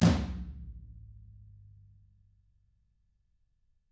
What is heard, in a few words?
TAMA TOMR, flam; ILIO; Double Platinum Drums 1